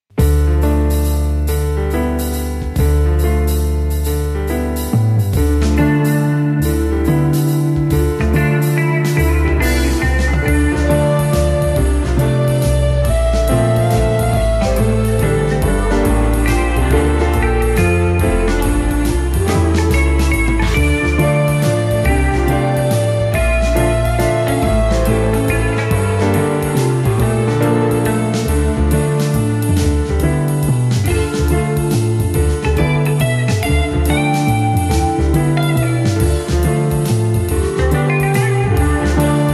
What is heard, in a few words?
Jazz of Storms